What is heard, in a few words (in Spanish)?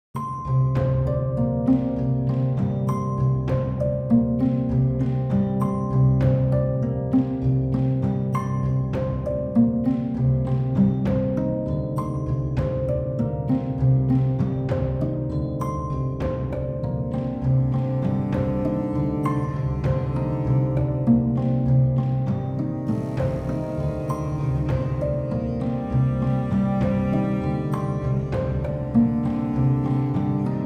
arpa